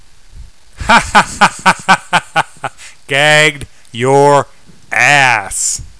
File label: gag